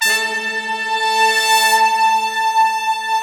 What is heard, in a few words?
BIG BRASS, SlowBigBrass; Optical Media International - Sonic Images Library